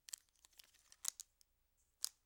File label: Fold Plastic Sunglasses; Fairlight Sound Lib